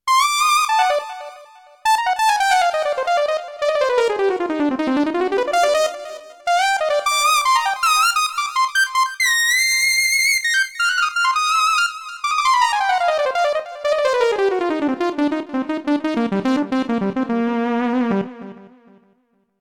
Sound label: synthlead